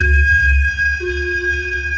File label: sonar Ping Water Far Shuttle; Barotrauma; Real Sonar